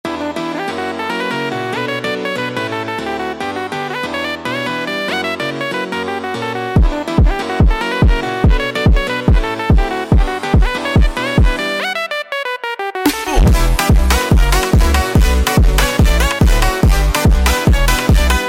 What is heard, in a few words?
Funk sped Up